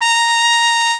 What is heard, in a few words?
mexican trumpet